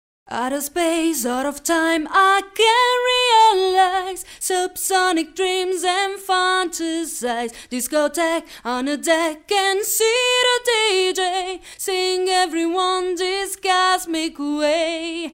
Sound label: Vox